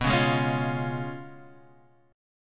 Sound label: Db Major B; strummed